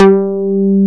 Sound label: bass loop; Best Service Gigapack 2